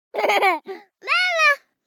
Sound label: BABIES LAUGH Boy 12 months Mama Short; Baby Boom